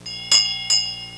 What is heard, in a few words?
SHIP BEL, Bells